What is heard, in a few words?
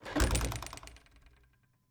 Cabin, front door, split door, oak, large, iron fittings, open, hyper; Eiravaein Works; Vaeyan IV